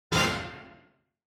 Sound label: Quiz Question High